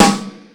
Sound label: METAL SD, MOD. DRUMS; East West; Global Sounds